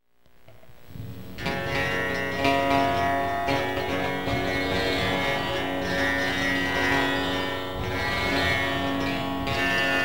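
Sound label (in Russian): ситар мелодичный металлический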